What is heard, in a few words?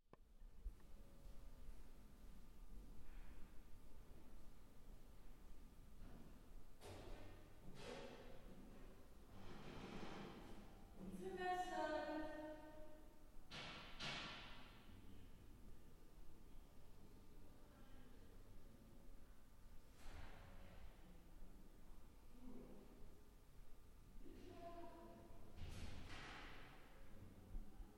universitatsplatz cultural museum